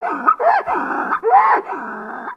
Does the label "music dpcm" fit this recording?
No